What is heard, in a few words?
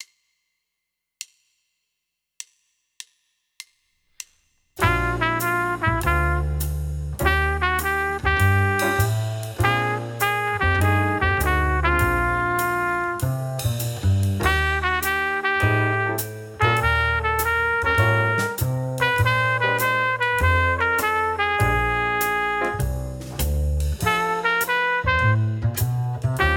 Jazz Etude; Jazz Etude #1 Trumpet and Track